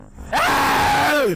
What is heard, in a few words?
scream backwards